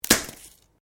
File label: Branches Dead Snap